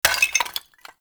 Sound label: Vase Clay Tiny; Objects; Vases